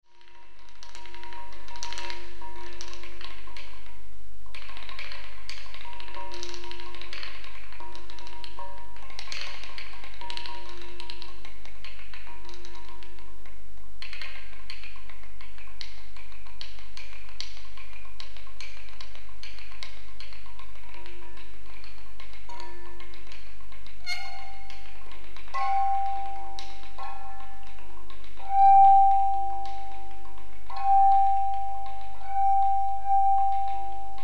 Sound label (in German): geriebene Steine, Klangstelen